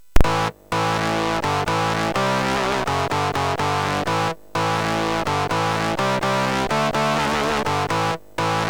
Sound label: Guitar, sid MOS6581R3